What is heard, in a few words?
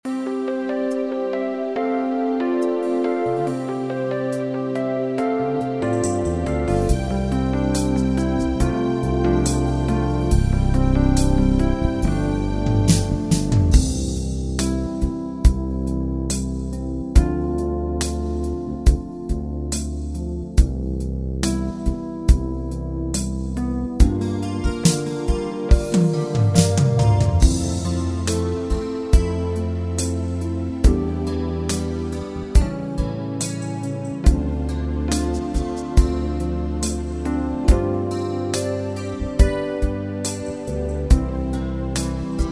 Sweat Beat; wersi